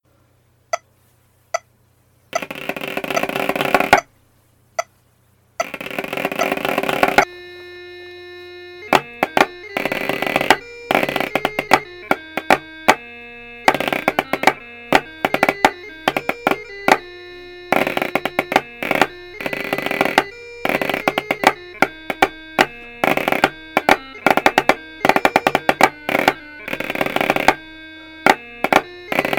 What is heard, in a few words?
Snare Chanter